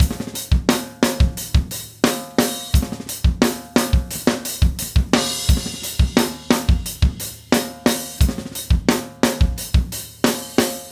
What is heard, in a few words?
4 bar loop